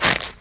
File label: step; wob2 demo